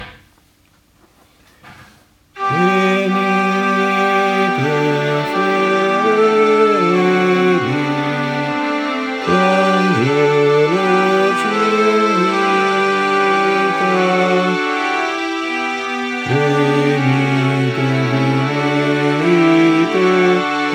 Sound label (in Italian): Contralti Bassi; Venite Fedeli